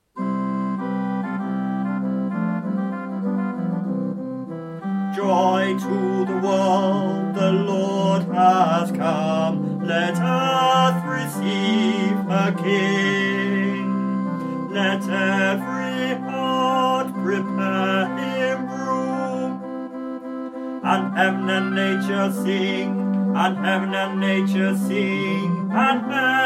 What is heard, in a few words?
Joy to the World Tenor